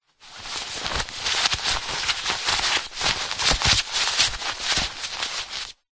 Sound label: foley fabric thick fast heavy movement; zapsplat